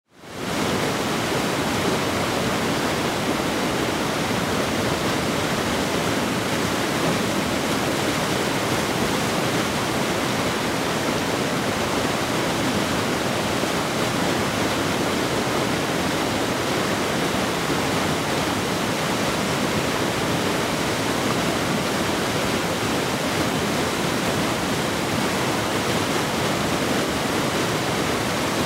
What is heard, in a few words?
Creek recording; LV Creek